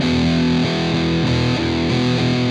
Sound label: Padding, Guitars; ACID Music